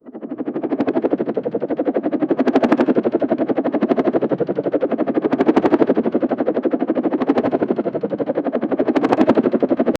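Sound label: helicopter blades rotating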